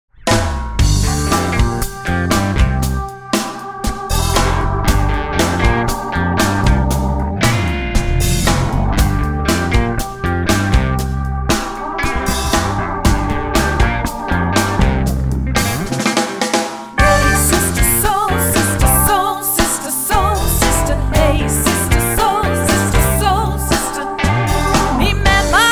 dance band

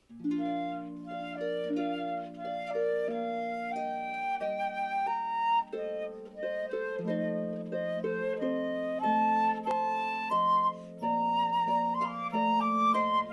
Harp

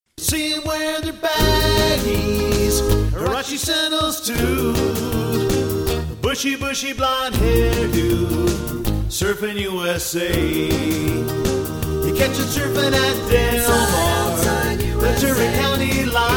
Surf and Beach Music Band; Jazz Classical Rockband

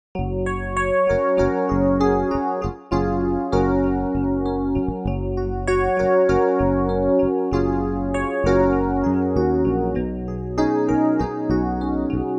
pop, jazz piano

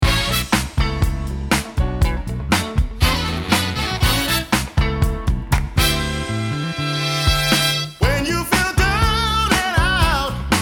dance band

